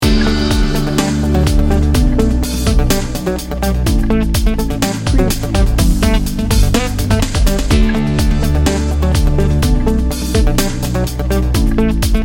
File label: dreamy dancer loop